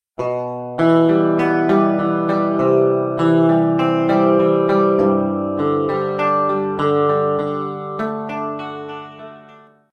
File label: fingerpicking example, electric guitar